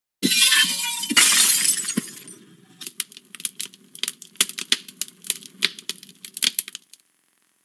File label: glass break and eating screen sound effect